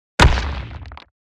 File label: BOW hits